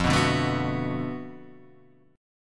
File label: B Minor 7th F, chord; Bm7/F; strummed; JGuitar